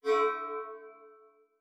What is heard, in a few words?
conv bell; M8